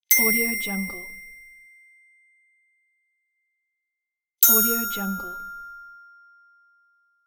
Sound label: bell ding